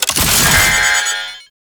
Paratrooper Hack Alarm Activation; Warframe; Lasrian Soldiers

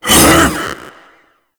Attack Short, Vocals; Warframe; Proto Infested; Ape Prelate

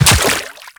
Step Water, Footsteps

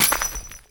Step Dirt, Footsteps; Warframe; Grineer; Royal Jester; Foley

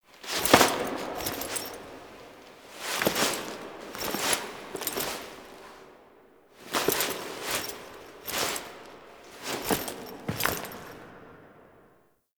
Foley; Warframe; Tau; Cinematics; LastBreath

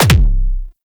Wrist Gun Punch; Warframe; Tenno; Incarnon

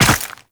Land Ice, Footsteps; Warframe; Tenno

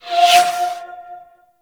Spirit Primary Fire Whizzby; Warframe; Grineer; Thumper Bot; Sentient Spirits

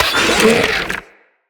Spider Vocal Pain; Vocals; Root Spider Mother

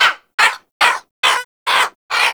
Bird Of Prey Vocal Idle Beg

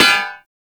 Melee Hammer Impact Generic; Warframe; Hammers

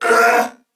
Pain, Male; Dialog; Barks; Battle; Corpus Venus; Warframe; CorpusVenusMaleA

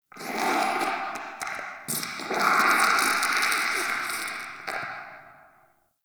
Interior Goop Distant; Ambience; Indoor; Warframe; Infested Eris

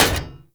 Short Sword Medium Impact Dmg Metal

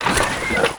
Shock Rifle Empty Fire; Warframe; Weapons; UT Weapons

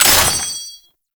Exalted Sniper Last Shot; Warframe; Frumentarius

